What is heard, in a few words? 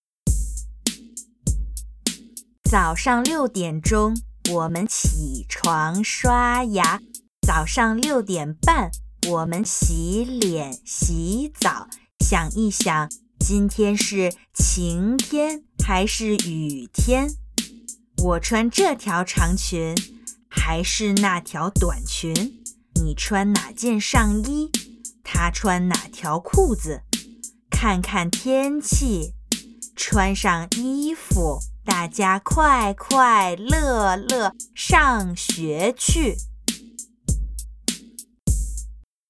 Chant